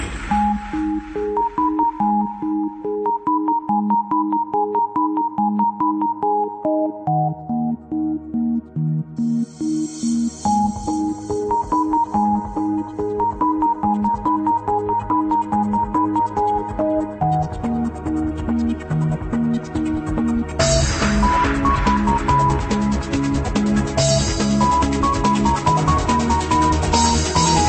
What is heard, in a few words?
Space Melody